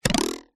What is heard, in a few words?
cartoon twang spring wooden ruler